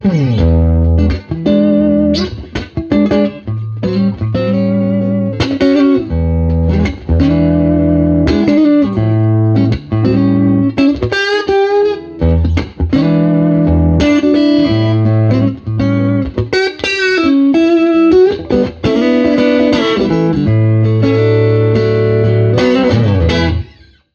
Custom Bandmaster Blues; AmpliTube; Fender 2; 真空管アンプ

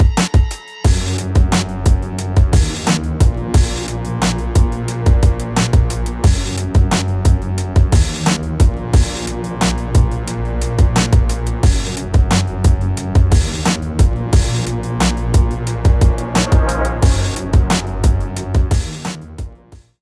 BRIGHT MIX Unprocessed; MASTER EQ 432

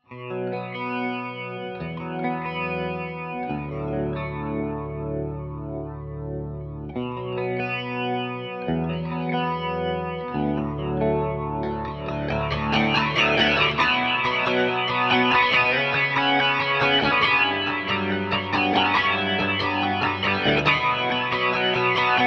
X-VIBE ELECTRIC; xgear

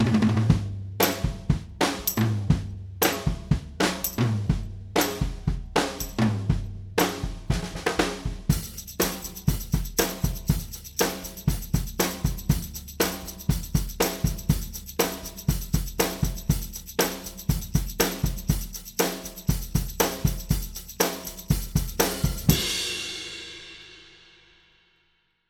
brighter drums unprocessed; LINEAR PHASE EQ

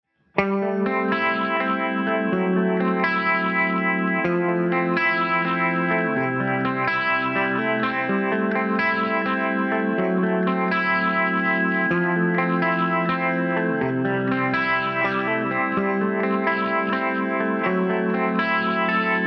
X-VIBE PHAZER; xgear

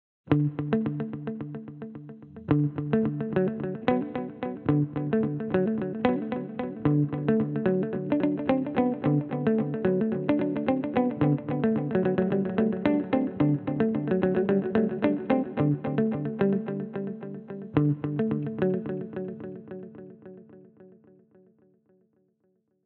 TIME PING PONG; xgear

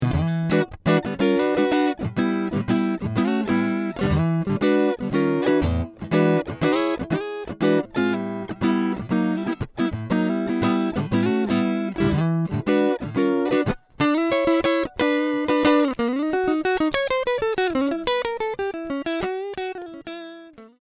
Blues Vibroverb + Vibratone; fender

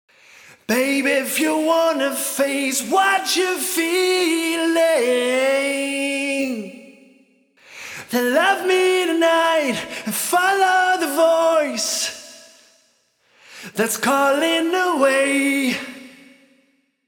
vocals distortion unprocessed; Saturator X

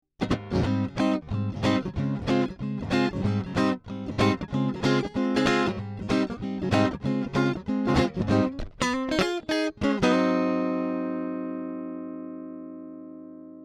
Jazzy Twin unproc; fender

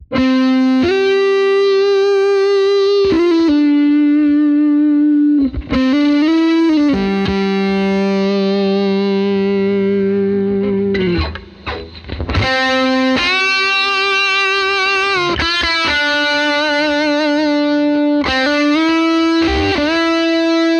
Custom Bandmaster Blues Lead; AmpliTube; Fender 2; 真空管アンプ

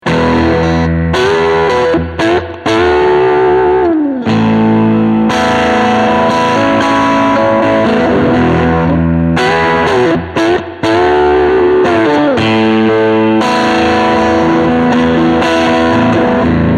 Vibroverb + Fuzz; fender

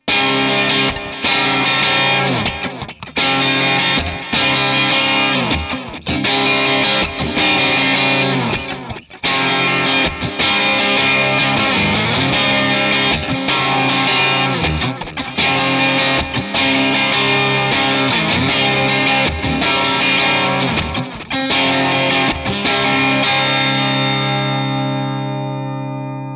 Crunch Chords, MultiFX; iRig UA

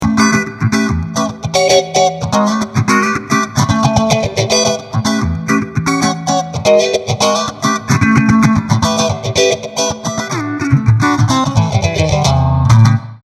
Twin Reverb + Phaser; fender

